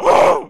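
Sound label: female zombie hit; Project Zomboid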